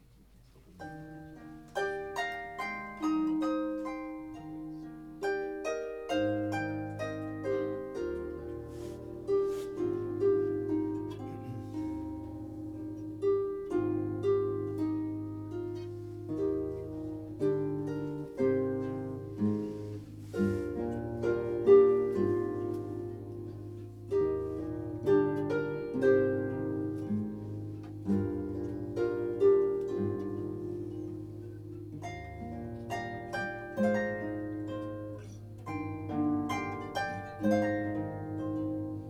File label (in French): Duo guitare et harpe; Mo li hua